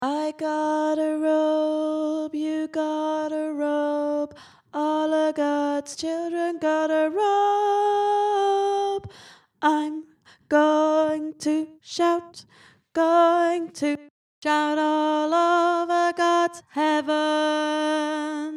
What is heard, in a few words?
Al gods chillun Alto, Gospel Choir